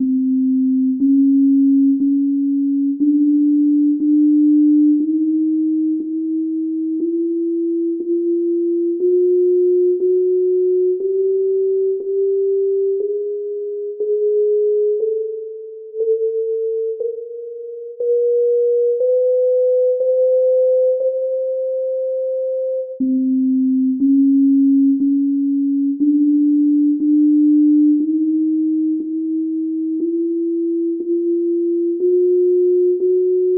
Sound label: 41-EDO Miracle scale and triads sine waves